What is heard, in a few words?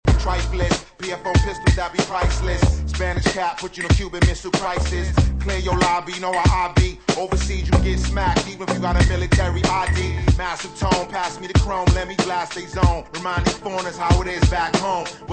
rap; rap from middle